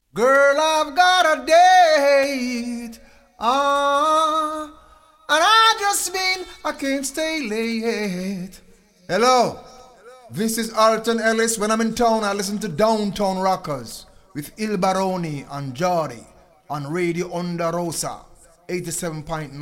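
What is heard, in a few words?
Jingle, reggae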